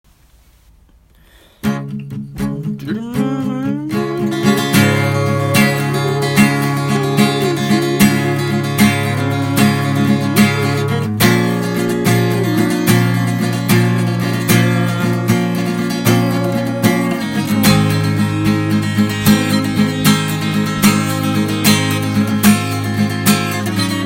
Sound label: Chorus to verse